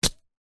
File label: warfare knife stab into soil dirt single